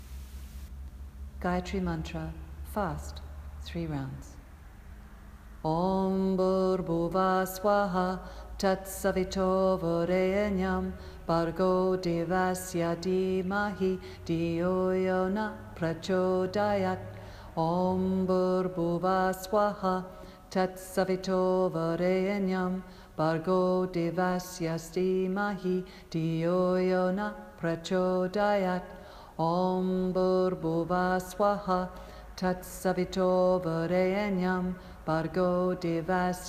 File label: Gayatri Fast